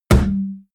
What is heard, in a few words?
can heavy hit saturated